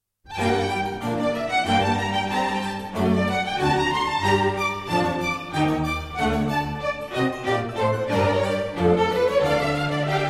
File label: Cello Concerto in G Minor, Allegro Non Tanto; Monn